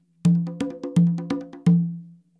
frise, congas

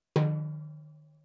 Timbales grave peau

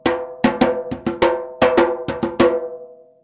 Djembe phrase de base

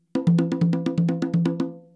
roulement ternaire, congas

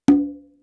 Congas tonique fut ouvert

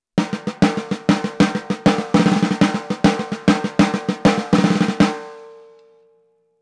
Caisse claire biguine

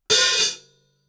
H-H tranche ouvert avec fermeture immediate; cymbales

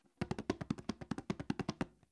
roulement, congas

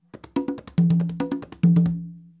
roulement, congas

